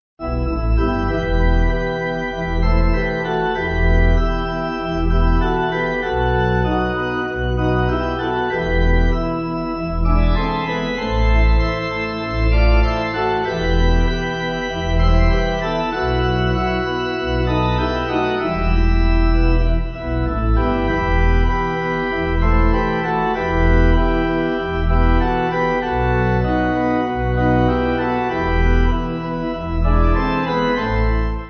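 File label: Pipe, Pipe Organ backing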